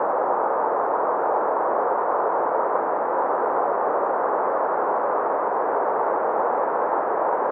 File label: Ambient Noise, White Noise